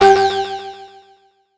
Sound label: mad shock